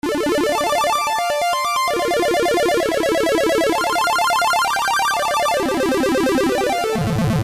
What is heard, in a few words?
Chiptune BEAT